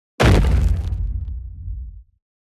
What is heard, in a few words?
Superhero inbound